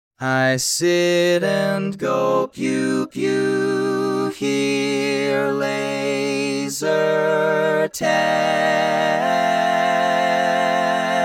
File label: Tenor, barbershop tags